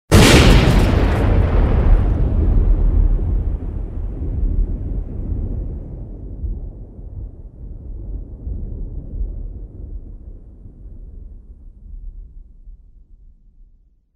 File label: Explosion; The 39 Steps